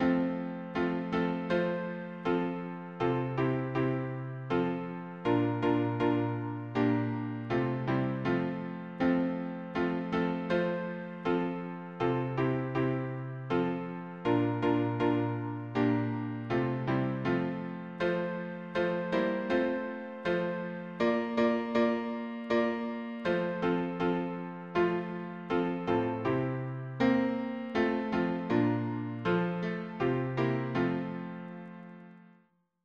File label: harmony piano